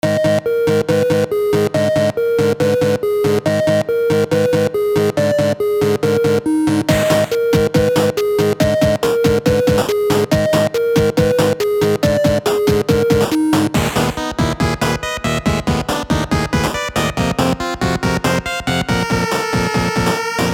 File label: 8bit unknown planet